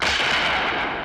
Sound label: Echoe Shot